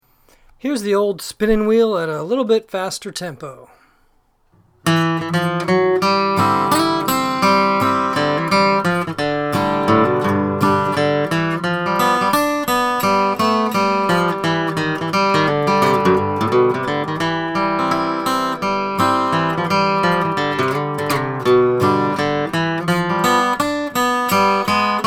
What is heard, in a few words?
Spinning Wheel Fast